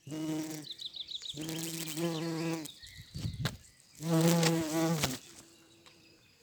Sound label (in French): Bourdon jaune ya du Bzzt; Vols Bourdn abeilles